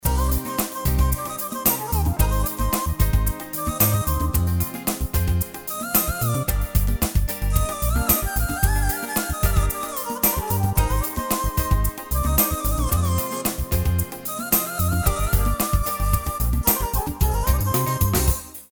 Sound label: ney; triton extreme